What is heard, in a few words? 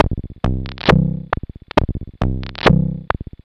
Pumping in C, Synth Bass Loops; Sonic Foundry (Sony Creative Software); Groove Elements